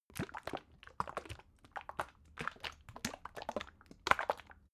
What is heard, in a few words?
bottle syrup movement; berufe